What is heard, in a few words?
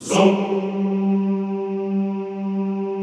ZOOMMM G2, Classical Choir; Best Service; Extended Classical Choir